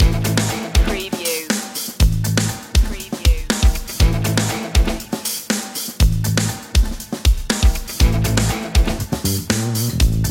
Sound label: big machines loop